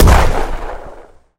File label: explosion